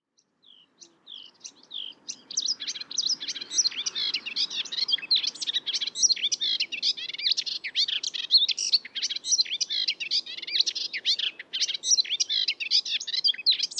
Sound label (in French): hypolaïs polyglotte Hippolais polyglotta; sorties nature 2025